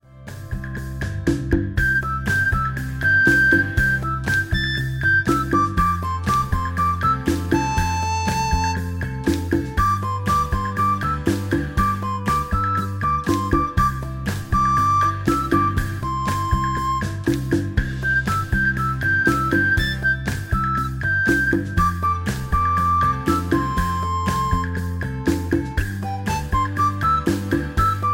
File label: Happy beat, RINGTONE; MIUI12